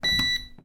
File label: dryer button press beep; household; zapsplat